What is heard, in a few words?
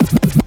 Minimal Fills, Minimal House; Minimal Loops